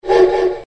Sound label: Train Whistle